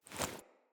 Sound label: Blacksmith Hammer Strike Foley; Blacksmithing; Friendly; Tuvalkane